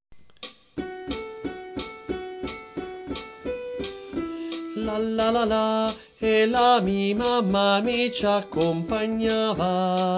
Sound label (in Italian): Tenori, Coro; Coro Isola del Giglio